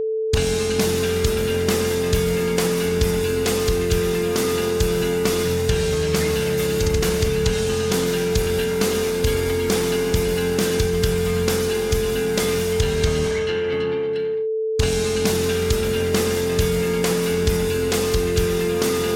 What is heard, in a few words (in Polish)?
sinus